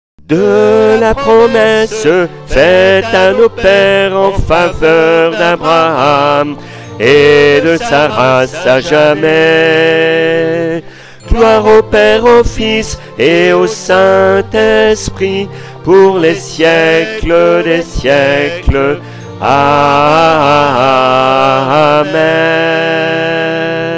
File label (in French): Magnificat DUO alto